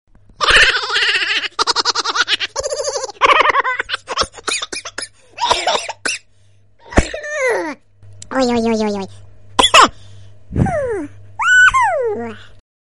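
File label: laughing kids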